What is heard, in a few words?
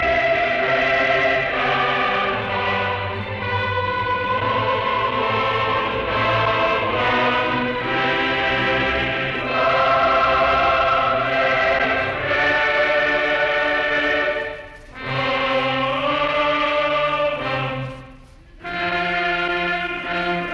Symphony in D minor Choral Finale Andante maestoso; Symphony No 9; Op 125; Arturo Toscanini